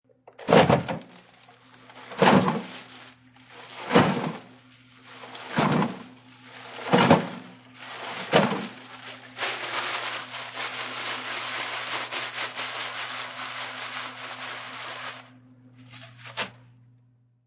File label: Paper Towel Rip Rustle Throw, Pulling paper towel from a dispenser, rustling, and throwin; throwing into trash; Classroom